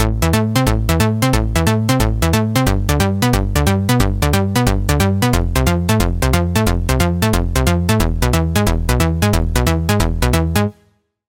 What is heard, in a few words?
Pluck, Sylenth1; Routed Sound; Sylenth1 Expansion - Star Scavenger Vol.1